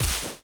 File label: Vine Plat Cut